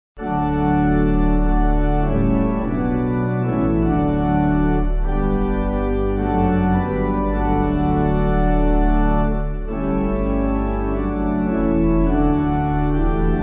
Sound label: Pipe Organ, Music Backing; Take Thou Our Minds; Hall (Laufer)